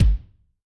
Velo Room, Kick, Yamaha DTXpress-II

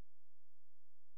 C#, Synclav FM, FX; Big Fish Audio

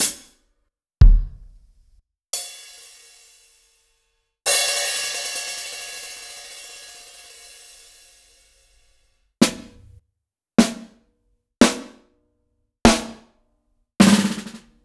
Acoustic, Kit; Sliced

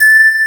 FMA PAN, WIND; AKAI S6000 CD-ROM - Volume 1; BrassWind; WIND&HORN

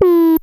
COMPUTA TONE; Zero-G Ambient 1; COMPUTACHATA

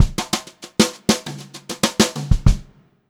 FILL, Brushed Drum Loops

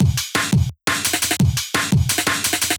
Boom Bam, breaks; m8 breaks

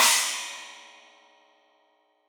China, Crash, China Cymbal

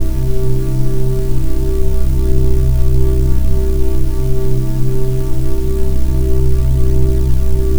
Drone Ambient; Drums & Drones

